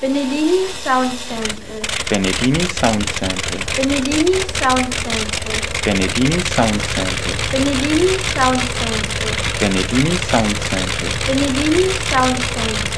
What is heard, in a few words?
Bristol Hercules 14 cyl radial